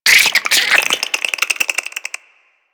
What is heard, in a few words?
Infested Minion Attack, Vocals; Warframe